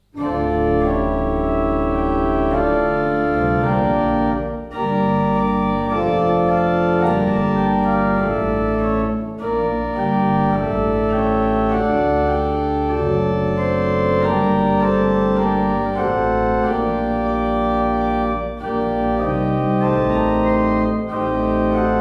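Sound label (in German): Orgel, pfeifenorgeln; Seifert Kevelaer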